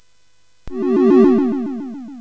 Dark Castle, CSG8580R5

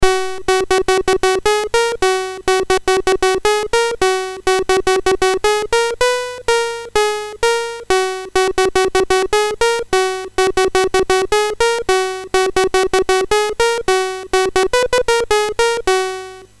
Music BASIC, MOS6581R3

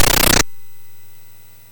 MOS6581R3, GAMES; Spikes Peak

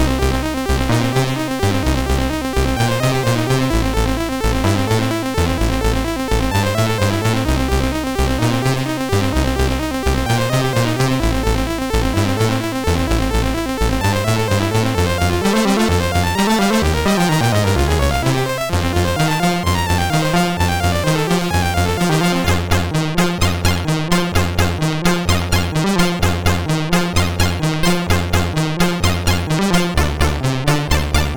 tape version MOS6581R4; sid

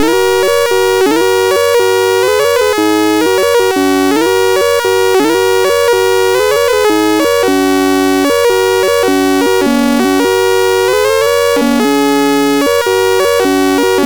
sid MOS6581R3, GAMES; Auto-Mania

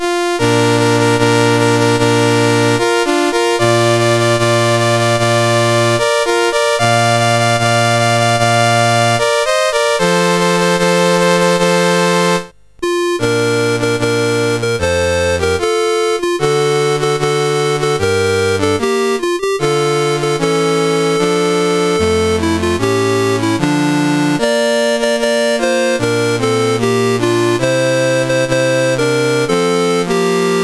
Abu Dhabi, National Anthems; CSG8580R5; Dave Marquis

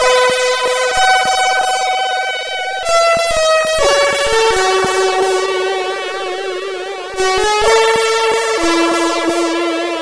BGM, CSG8580R5; HVSC

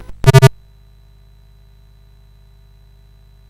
Chopper, CSG8580R5

